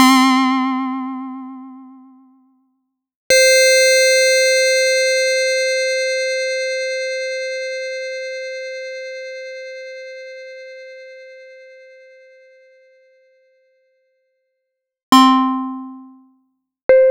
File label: Roland SH-09 Leads and Keys